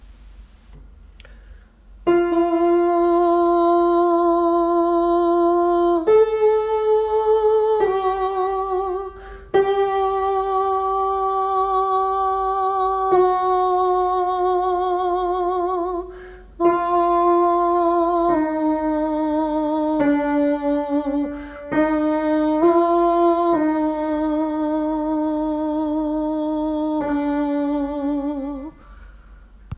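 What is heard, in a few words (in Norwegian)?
vår gud skal ha æren o-ing alt; åfjord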